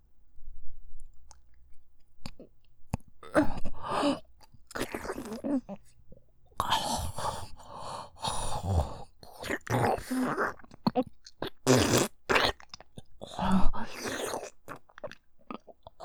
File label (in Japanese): フェラ音(嗚咽しながら喉奥フェラ)